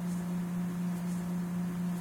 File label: aircon; tetsuon